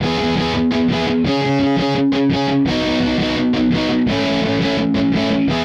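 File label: Guitar Punk E major 170bpm; Guitar and Bass; Ableton; Factory Packs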